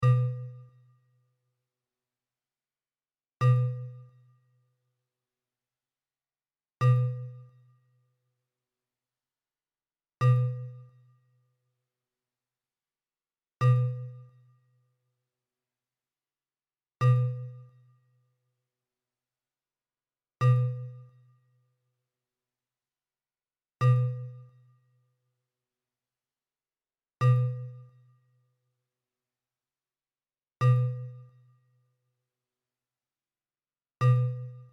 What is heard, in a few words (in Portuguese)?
amostra de pulsos com periodicidade